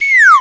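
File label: bomb whistle; sfx; Universo Animado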